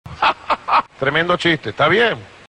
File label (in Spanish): jaja; tremendo chiste